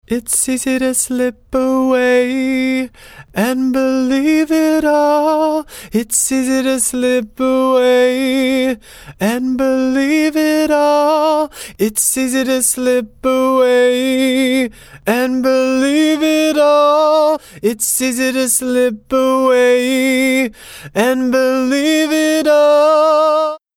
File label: vocal dry